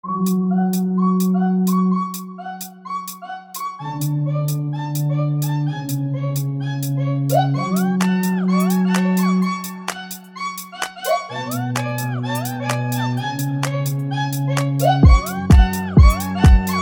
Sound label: beat